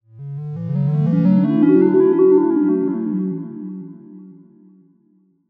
sound design harmonic ascending warm notes delayed